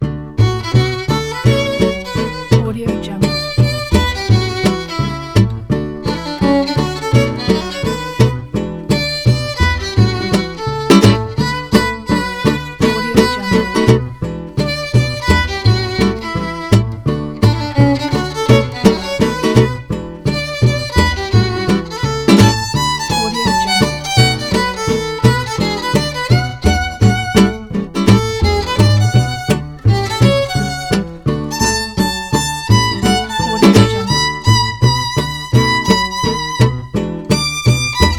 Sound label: comedy gypsy jazz violin